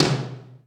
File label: Tom H1, Roland U220; drum machine